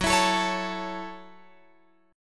Gb Minor 7th, Guitar Open F; Gbm7; strummed

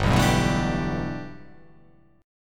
G 6th Add 9th G, chord

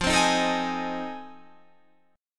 F 7th Sharp 9th; F7#9 strummed

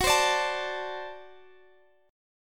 D 7th F sharp, guitar

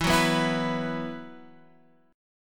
E Suspended 2nd, Guitar Half Step Down; Esus2

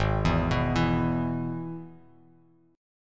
Ab Minor Sharp 5th Ab, Bass Standard; arpeggiated; 4,7,9,9

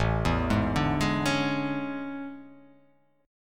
A Major 11th, down; AM11; arpeggiated; JGuitar

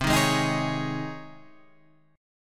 C sharp Major 7th Suspended 2nd, C

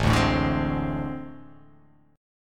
A Minor Major 7th Double Flat 5th, down

